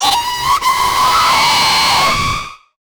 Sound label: animatronic screamer sound; robotic